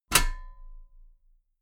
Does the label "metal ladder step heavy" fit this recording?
Yes